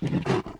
attack

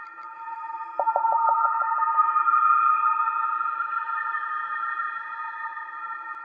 TELEKINESIS BRIDGE

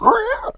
hurt; mahlendur

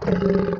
HURT

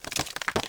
crumble

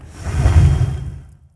spit fire

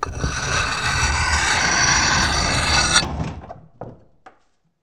sharpen blade

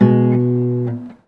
LUTE

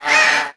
hurt

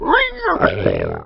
HURT; mahlendur

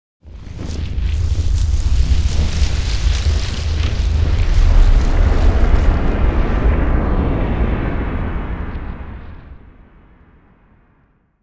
LAST DOOR EVENT

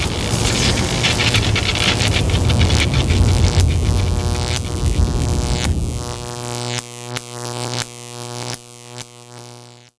LIGHTNING; mahlendur

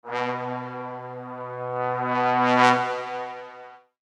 trumpet

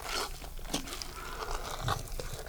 EATING

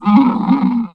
hurt

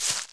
step earth

